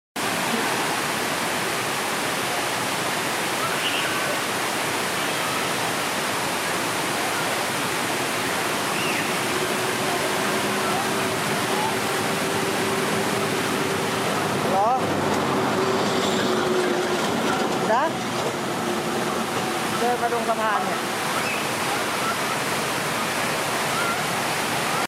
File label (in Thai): เสียงบรรยากาศน้ำตกเกริงกระเวีย